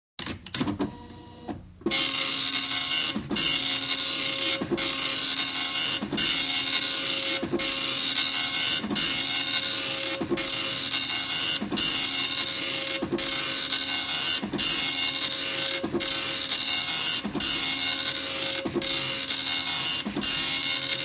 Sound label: Dot matrix printer in self-test mode; SOUND EFFECTS1